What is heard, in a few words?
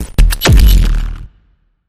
Quick Draw Laser Shot